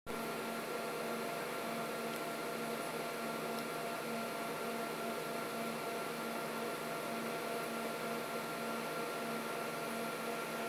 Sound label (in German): Serverschrankgeräusch Nachher; Dämmung